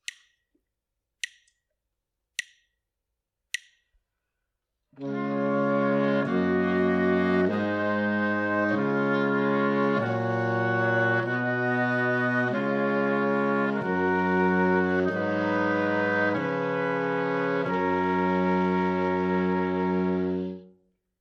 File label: harmonic dictation